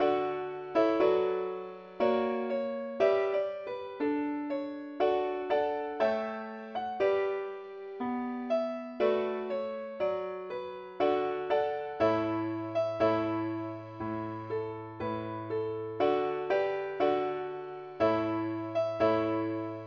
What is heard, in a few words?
ukelele key D